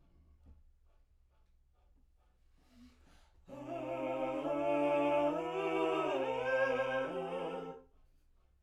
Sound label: Backs; SCHOEPS